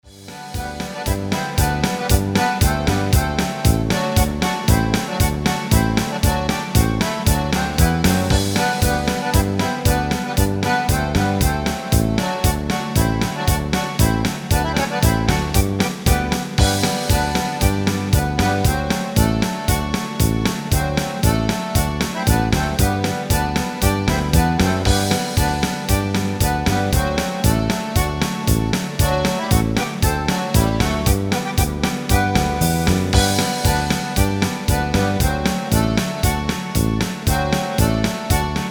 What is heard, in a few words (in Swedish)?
tjeckisk polka